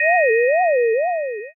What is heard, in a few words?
flashback in